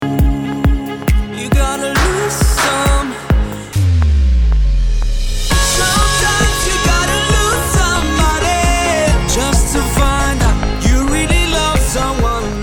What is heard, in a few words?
BPM135